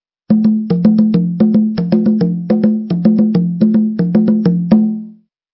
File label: Stinger; STINGERS